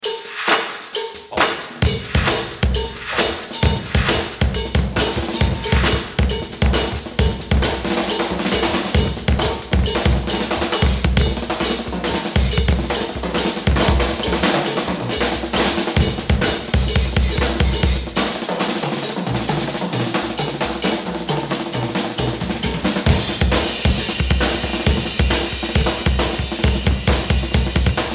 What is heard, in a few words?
Drum Solo